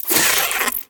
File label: insect attack; Insects